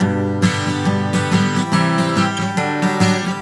GTR, Celtic Flavours; USB Soundscan vol.42; AKAI

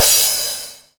CYM, Dance Drums; Best Service ProSamples vol.15; AKAI

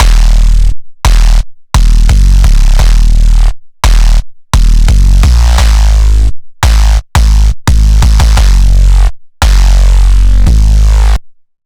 D sharp 86 BPM

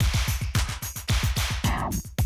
110, Classic Drum Loops

